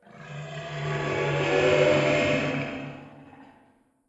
distant

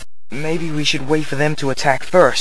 attack first, grunt; fgrunt

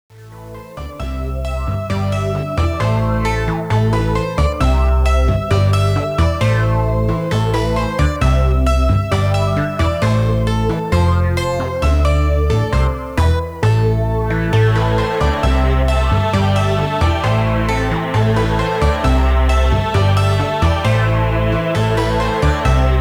klavesin

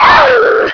small die, small jumper; ghouls forest2

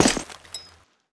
step; HL2 combine

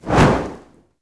flare movement

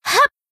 jump; hina

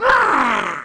kid pain; buu

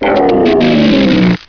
robot die

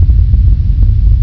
quake

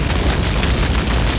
idle, weapons

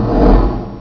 kingpin attack

